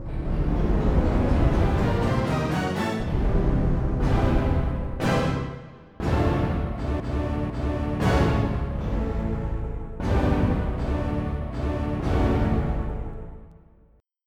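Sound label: Orchestra Hit; EverQuest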